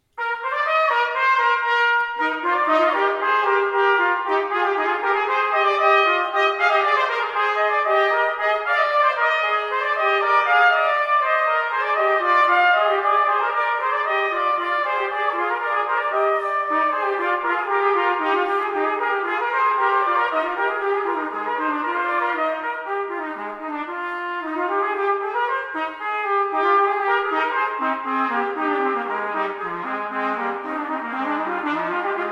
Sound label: duet, trumpet; Vizzutti